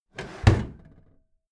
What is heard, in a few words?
packed drawer smooth clatter close heavy halfway